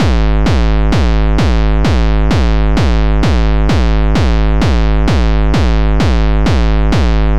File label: kick lp